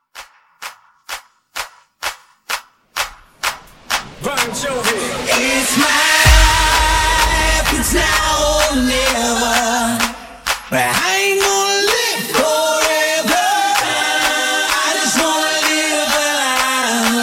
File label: Clap Apella Starter 128